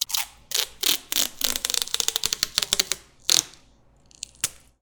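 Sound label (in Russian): малярный скотч разматывают и отрывают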